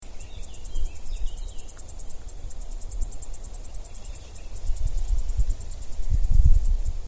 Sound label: Tettigettalna argentata